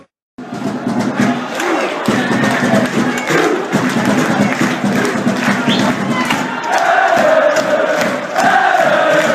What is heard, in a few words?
Rangers FC crowd